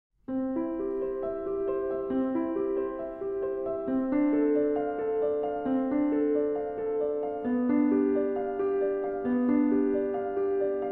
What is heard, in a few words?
The Well Tempered Clavier; Bach